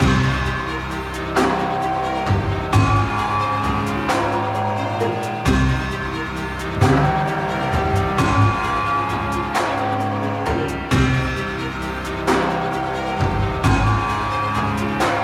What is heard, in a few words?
Battle High; Bogwurm